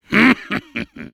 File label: Soldier laughshort, fr